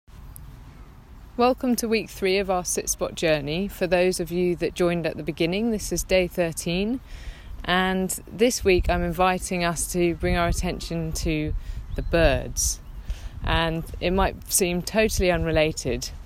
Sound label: bird sounds